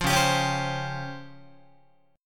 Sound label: Db Major 7th Flat 5th, Guitar; DbM7b5 strummed; JGuitar